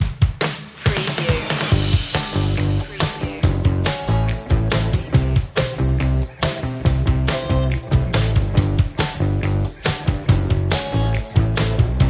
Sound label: intense rock